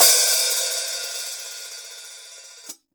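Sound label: gated overhead, Drums Acoustic; USB Soundscan vol.10; AKAI; 90s sample CD; GATEKIT 2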